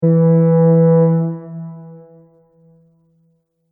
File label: Ship Horn Deep Short; Boat Sound Effects; vehicle